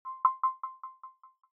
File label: ui button echo resonance high